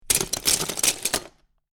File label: Lego Drop Several Pieces Into Lego Box; Miscellaneous Toy Sound Effects